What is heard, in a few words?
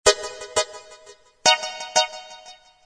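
rhythmic synths ringtone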